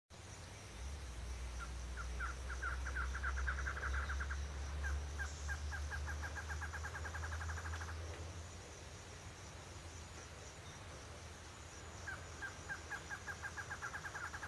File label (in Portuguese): Canto da choca de olho vermelho